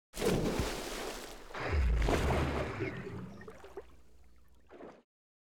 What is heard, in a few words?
Launch Sound Splashdown; Nasa